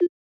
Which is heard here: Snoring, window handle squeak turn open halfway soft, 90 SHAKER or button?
button